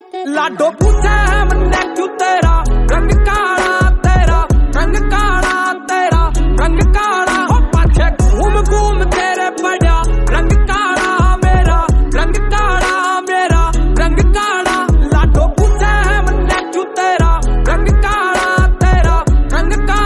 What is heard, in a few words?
punjabi song